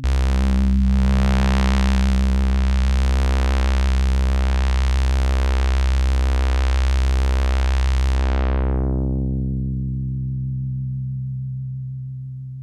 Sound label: Synth, PWM FM Low